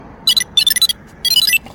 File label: whoosh bike on